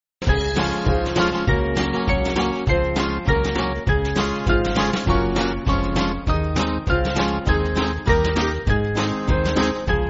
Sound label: Band, church music